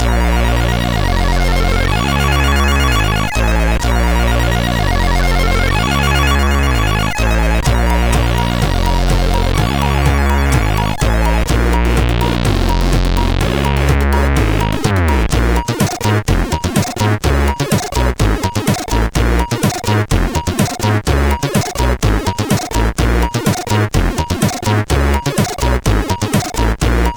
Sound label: Funky Bass, CSG8580R5